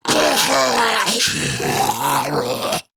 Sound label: Death Grineer Ghoul Sargeant; Grineer Ghoul Drill Sargeant; Battle Barks